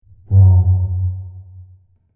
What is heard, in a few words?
wrong